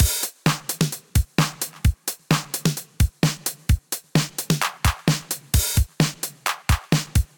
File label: 707 Groove, Drum Machines; Ableton